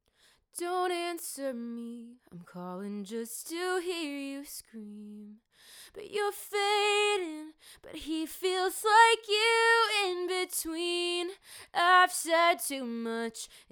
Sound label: singing microphone sample; Beyerdynamic M90 PRO X